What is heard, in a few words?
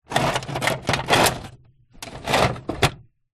industrial metal tools in metal tool box movements rummage; zapsplat